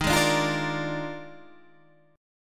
D sharp Major 7th Suspended 2nd Suspended 4th, Guitar, Standard; strummed; 11,11,12,10,11,10; JGuitar